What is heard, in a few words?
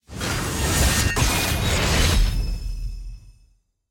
card activate; personalized offers